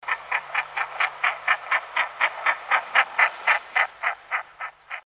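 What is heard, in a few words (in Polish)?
tracz długodzioby (szlachar), głosy; Mergus Serrator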